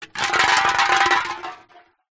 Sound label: food drink soda can empty roll concrete ground